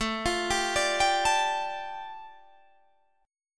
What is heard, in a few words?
G 7th Suspended 2nd